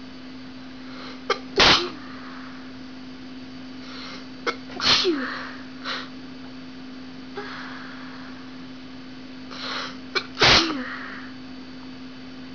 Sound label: sneezes, female